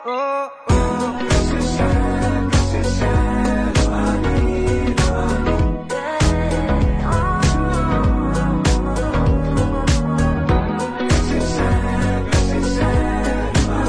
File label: Ringtone